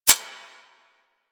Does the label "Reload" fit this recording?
Yes